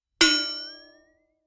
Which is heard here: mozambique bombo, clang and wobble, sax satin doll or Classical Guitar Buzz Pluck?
clang and wobble